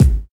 bumpin kick single hit a sharp key, Royality free kick drum sound tuned to the A# note.